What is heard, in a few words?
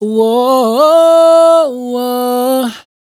riff whoa Am, Dry Vocals; Raw Vocals; Slate Digital Omnivox Vocal Sample Pack